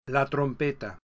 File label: TRUMPET